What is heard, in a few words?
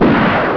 shot; Midway Games; Smash TV